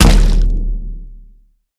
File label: impact; smite; god scylla